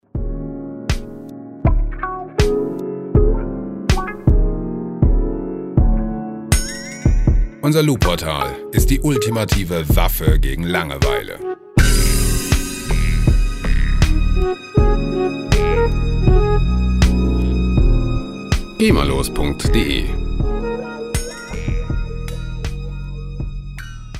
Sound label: the cage riff